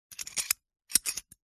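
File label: household bolt metal lock or unlock held in hand; zapsplat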